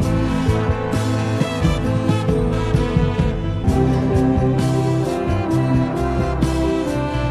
horns, phonky loops

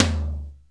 Toms, Real

